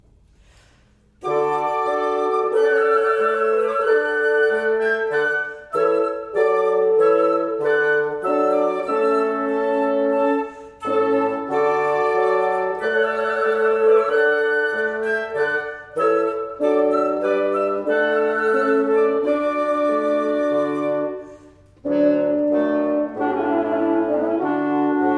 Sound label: Trumpet Voluntary; Clark